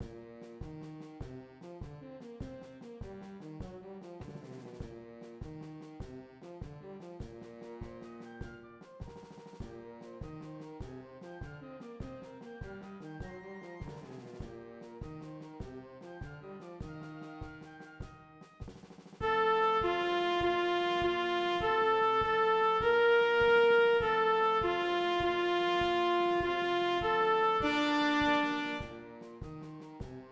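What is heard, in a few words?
clarinet 2, trumpet 3; Borgertog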